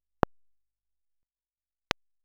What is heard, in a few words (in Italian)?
Martello B&K grosso - punta metallica - Legno + Marmo; Prove-Martelli